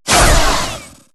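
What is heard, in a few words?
crowbar hit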